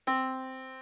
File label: Yamaha Disk, piano sound